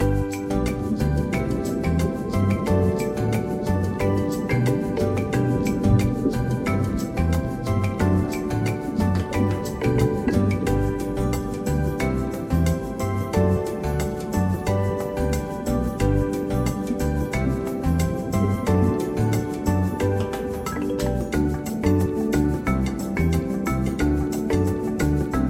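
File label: background music, slots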